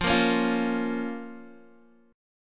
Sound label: F Suspended 4th Sharp 5th F, Guitar; strummed; 12,13,13,10,10,x; JGuitar